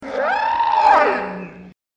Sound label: ghost Scream